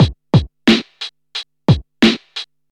89 bpm drum groove c sharp key, kick tuned to the C# note